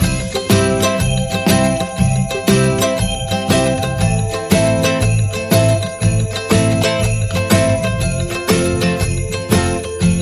Happy Christmas Ukulele, No Melody; 15sec

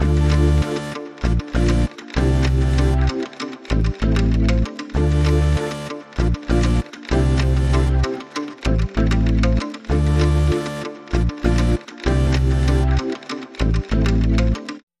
Inst No Beat

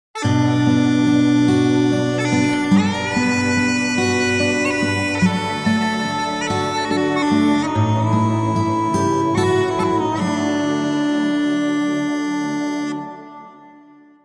Emerald Hymn, Sting

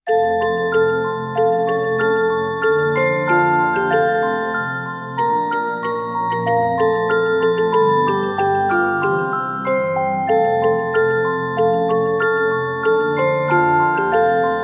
Jingle Bells, Carillon Theme Only

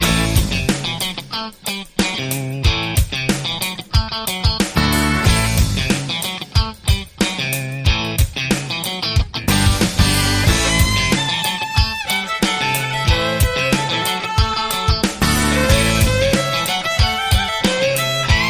Roadhouse Stomp

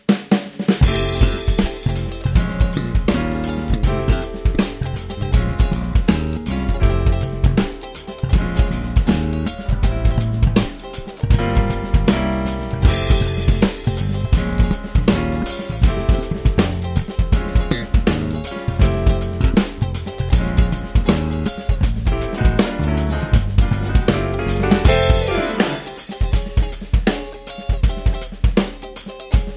Funk, No Melody

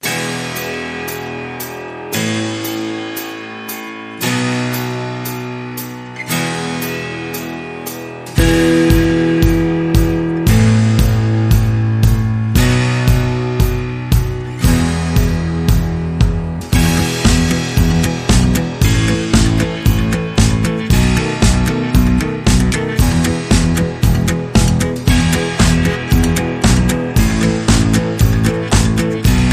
Jester Laugh, Upbeat

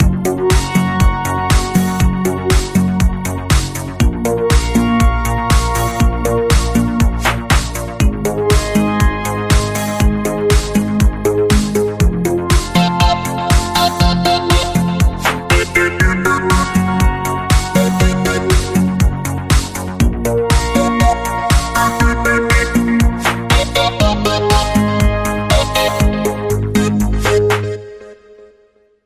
Hypnotic Synths Rhythm

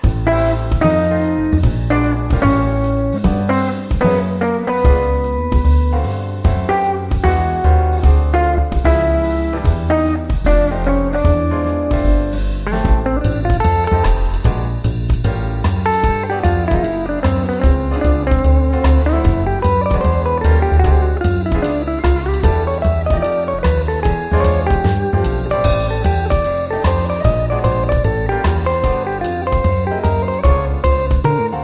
Bebop

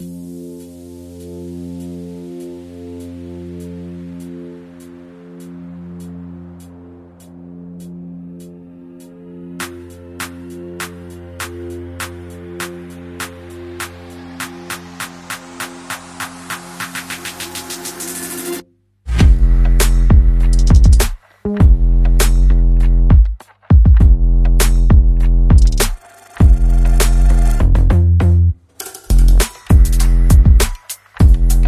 Drums FX and Bass Only

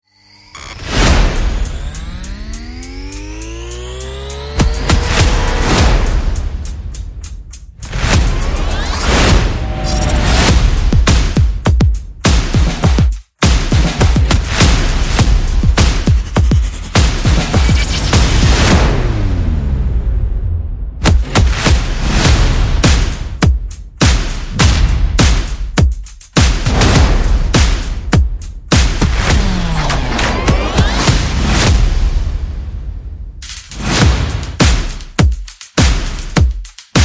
Percussion and Effects

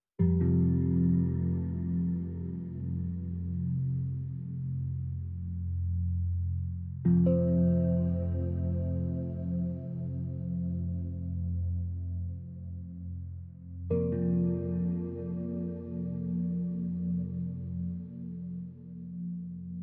Dark Chime Meditation Ambient

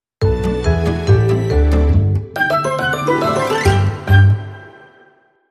50s Comedy

